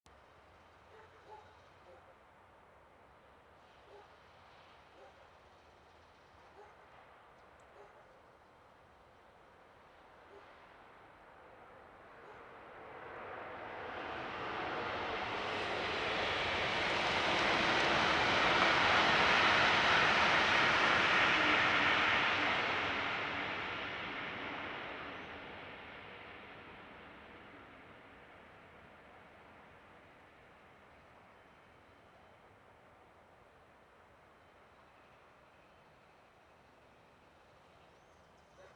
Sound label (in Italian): Treno mono; Eigenmike; A-weighted; Nuova Strada